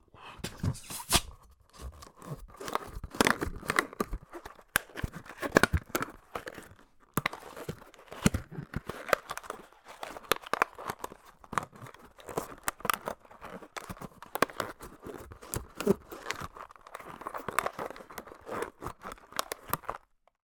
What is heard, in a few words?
foley balloon modelling twists movements